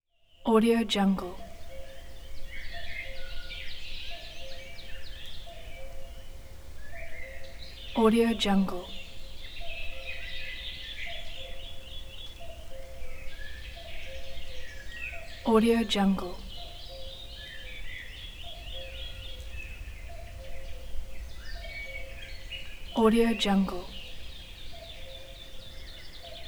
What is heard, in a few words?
summer forest ambience